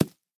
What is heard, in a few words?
grass step; Plants vs. Zombies